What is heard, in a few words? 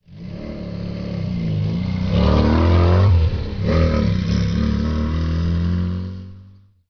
car gear; V-Rally DeLuxe v1.6